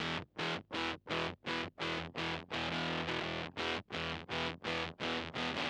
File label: nylon fuzz